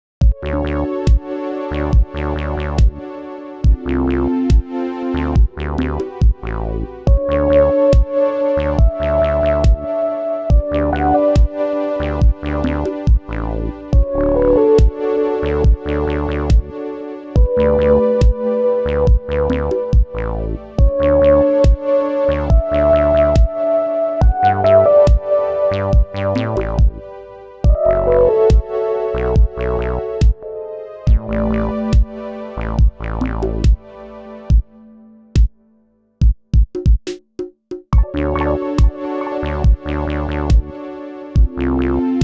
Chillwave Instrumental; animal crossing new horizons original soundtrack 2 2022

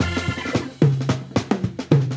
fill; When Vikings Attack; psn ps3 gamerip 2012

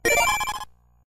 Selection Jingle; Pikmin 2 Puzzle Card e; GBA

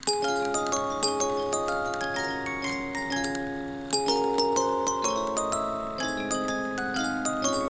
Boxy Jingle; Project Playtime

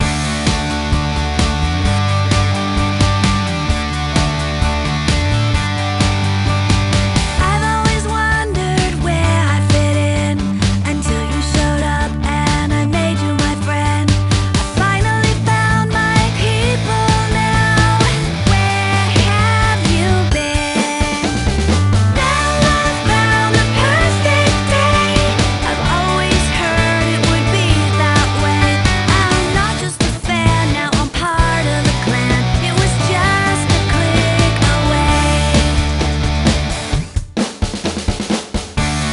Theme Vocals; Clique

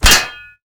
helmet hit

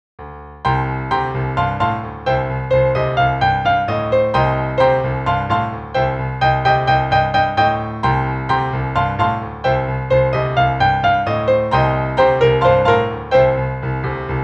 Piano; SimCity 2000

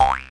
Ghost Bounce

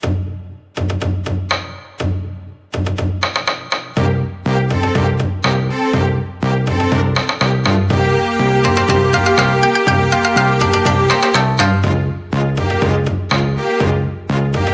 Jingle Rpg Map

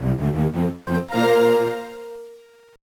Complete, gba

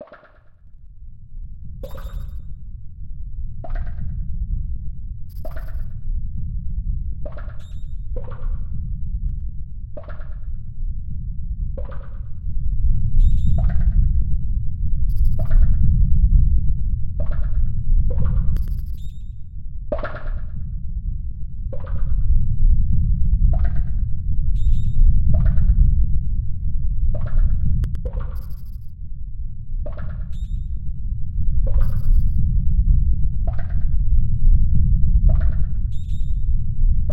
Dungeon Ambiance; marios mystery meat; snes; Radical Dreamers

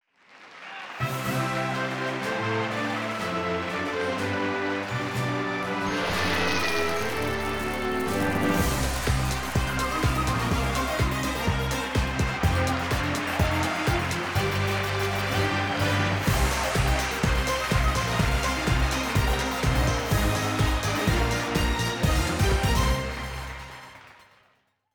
Intro Fanfare; buzz brain of the uk 2009 ps2

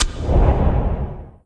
player adrenaline

